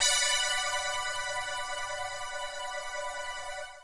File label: 125 pad E, Keys, Nu Jazz House